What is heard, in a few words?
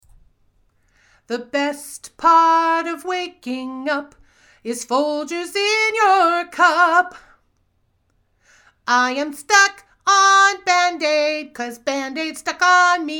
Commercial Jingles